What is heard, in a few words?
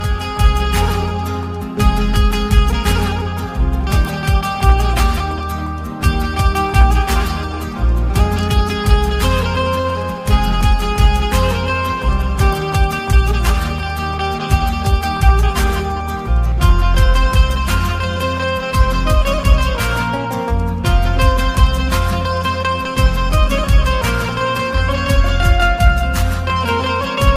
soft instrumental ringtones, bollywood